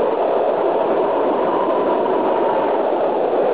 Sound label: wind